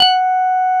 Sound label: Korg CX3, F#5